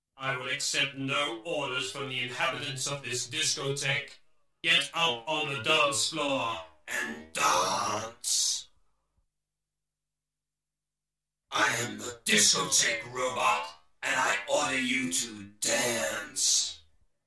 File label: Jingle